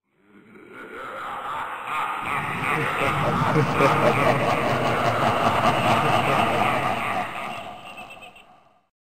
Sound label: Evil Laugh Rire Diabolique